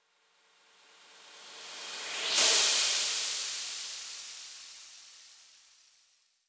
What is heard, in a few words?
Riser; Caffeind; Going Under